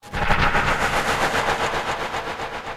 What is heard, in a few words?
voidfold cannon teleport